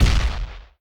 dragon walk; Crypt of the NecroDancer

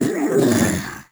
confused; Crypt of the NecroDancer

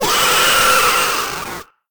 vampbat cry; Crypt of the NecroDancer

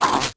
mimic chase; Crypt of the NecroDancer

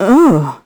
confused; Crypt of the NecroDancer

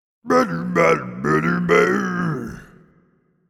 blues king cry; Crypt of the NecroDancer

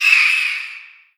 banshee hit; Crypt of the NecroDancer

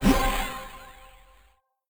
spell heal; Crypt of the NecroDancer

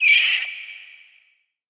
wraith death; Crypt of the NecroDancer

